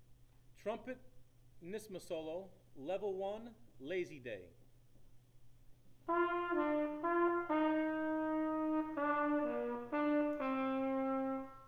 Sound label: Lazy Day Trumpet; Trumpet NYSSMA Solos